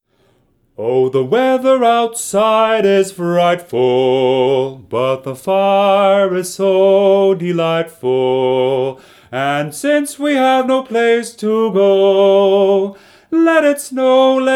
lead

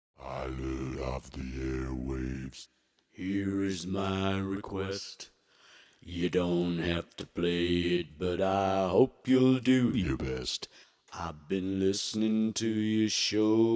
Bass Start

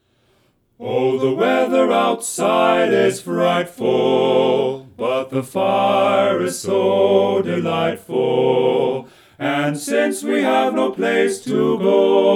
lead right

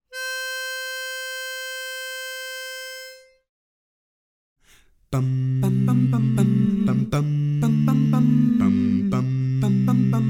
Bari missing

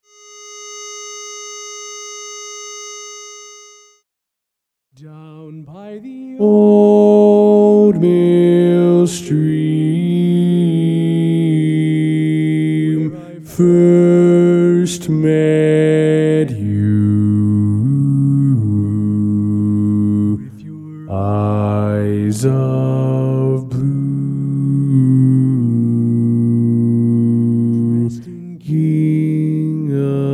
Bass

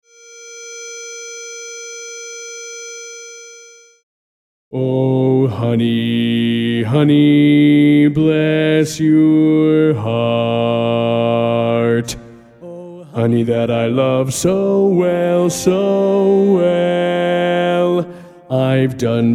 Medley Bass

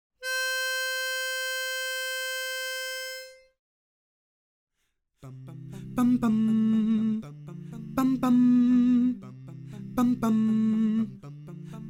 Tenor predom.

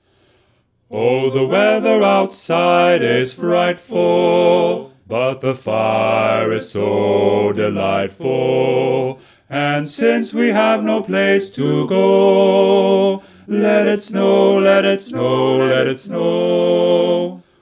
bass predom

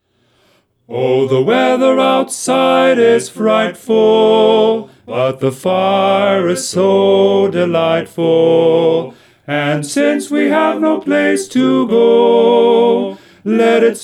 bari predom

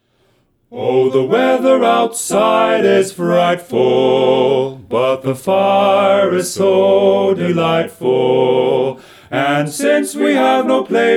lead predom

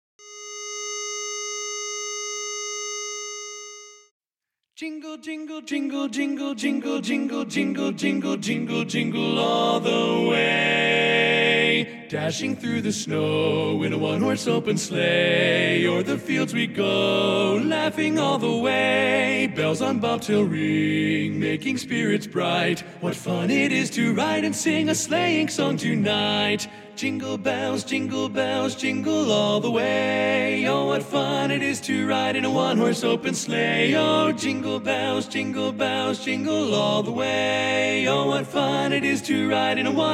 Jingle Bells Lead

